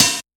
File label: Hihat Open; Ableton Live 12 Lite; Core Library; Drums